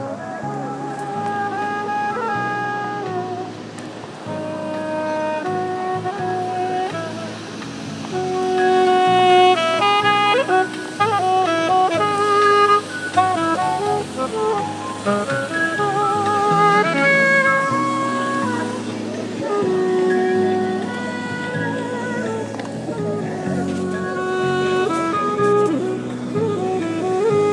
Saxophon am Pariser Platz

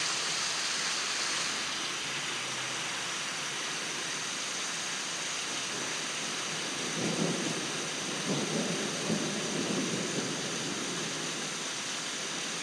Regen

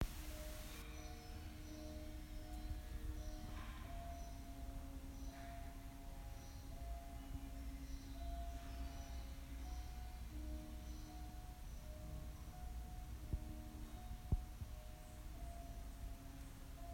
da hat jmd ihren wecker aber laut

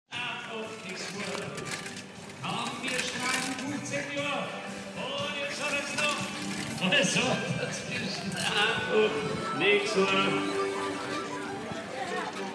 grillfest im pilspub mit livemusik; zum schamen

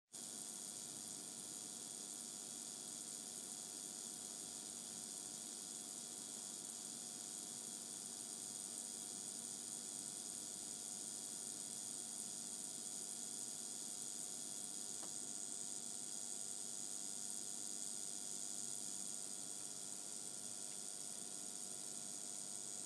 fernsehgerausche

